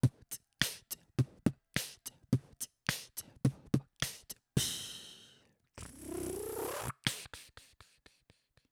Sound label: bubble scratch eight beat